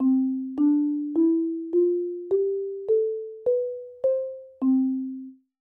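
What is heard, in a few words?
31 tone major scale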